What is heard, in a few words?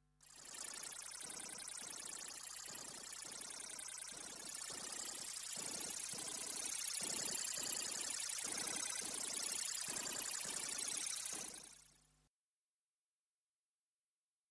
keys jangle